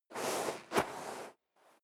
step sneaker sand scuff; schritte

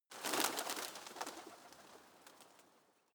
pigeons flutter short; natur-tiere; voegel

